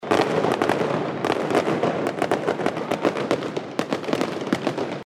fuegos artificiales

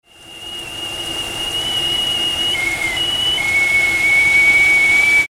agua hirviendo

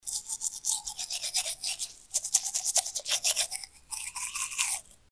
cepillado de dientes

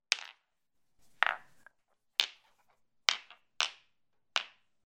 piedras

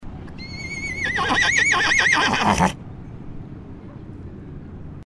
caballo

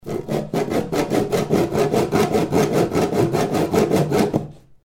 serrucho

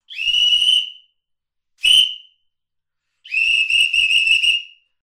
policia silbato

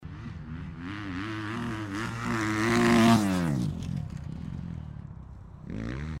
moto

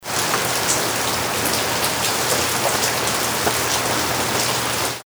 lluvia

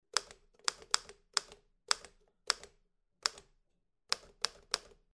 maquina de escribir

